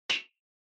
Retro Anime Switch High

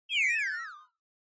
Onoma Negative Fast

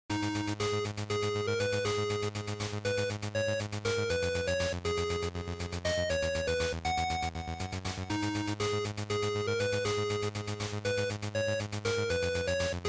GB Shooter Stage

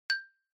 Xylophone, Single

